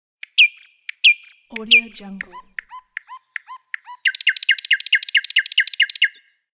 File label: bird